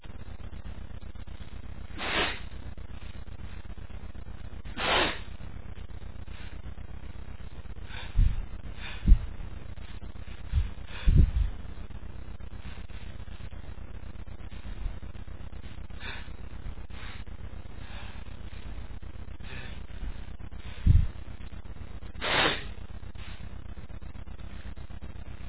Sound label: freshly cut grass, male, achoo